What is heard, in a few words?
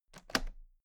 toolbox latches open heavy